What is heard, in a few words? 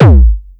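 bass drum electronic power; 300 Drum Machines; Yamaha Motif ES-Series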